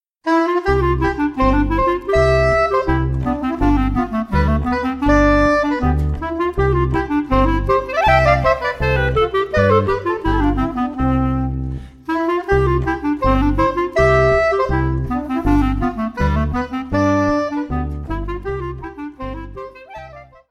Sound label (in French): Marche, Belgique